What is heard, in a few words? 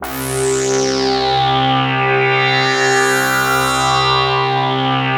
SYNT, 180 BPM; Ueberschall; Drum & Bass Resonance